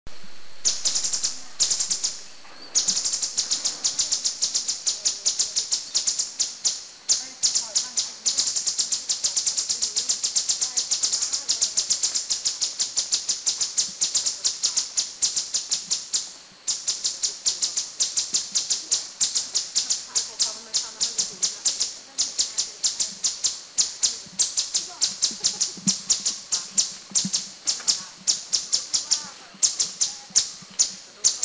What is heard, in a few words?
Grey-headed Canary Flycatcher Chiang Dao, Thailand